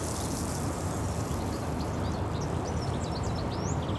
stieglitz

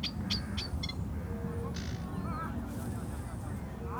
teichrohrsaenger

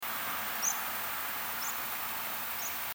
bergpieper

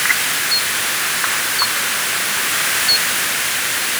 zwergfledermaus

